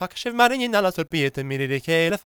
GAELIC, World Voices; ILIO; Vocal Planet

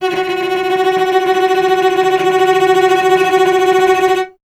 STR VC F#2, Cello; Miroslav Vitous; Solo Instruments VOL-1

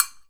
Agogo Hi Short; Digital Sound Factory

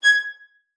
VIOLIN SPICC, G#6; Xsample VOL-5

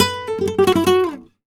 Guitar Picado, Flamenco, f#; Picado 2; Best Service ProSamples vol.46 - Flamenco

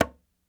Apentemma Mute, World Percussion; Sony CS

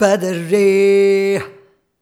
Rhythmic G

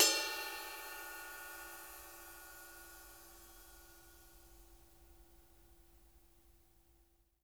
BELL, cymbals; Sampleheads - New York City Drumworks VOL-1; SW CYMBALS

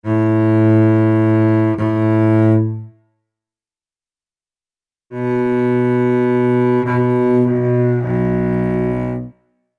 Celtic Cello; Sonic Foundry (Sony Creative Software); Continental Drift Aftershocks

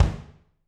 BD, Drums; Bob Clearmountain Drums I

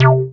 SQ-MATIC C4, BASS; Best Service Dream Experience

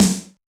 Yamaha DJX 2B, SD Rock

